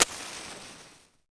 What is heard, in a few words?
Match Strike; Civ4 warlords